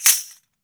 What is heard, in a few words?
JINGLE CLAP, JINGLE BELL